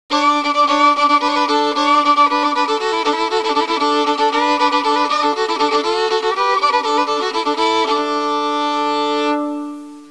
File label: suonerie musica traditional usa country; american